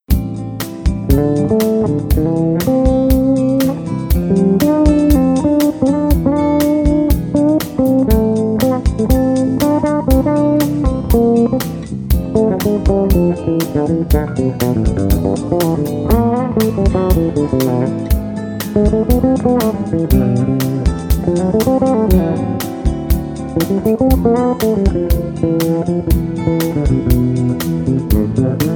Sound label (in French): basse